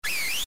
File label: whistle; ff3; ff3us